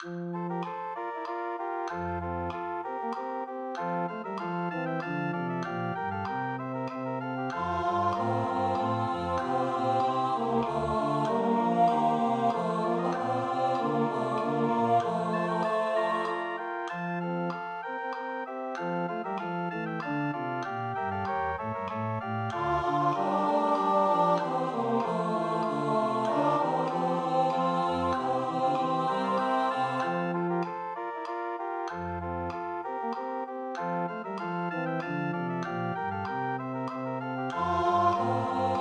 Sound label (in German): Tenor; Kantorei